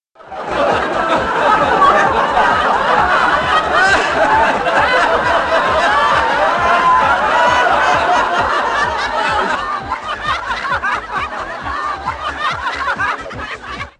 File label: Laughter